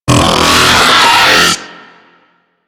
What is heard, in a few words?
Stomp Attack; Warframe; Ambulas